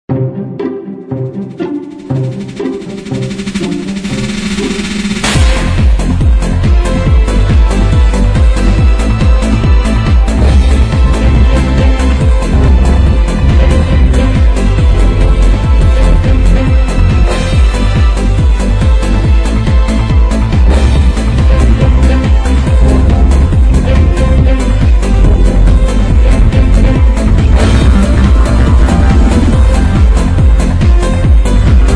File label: angels calling strings remix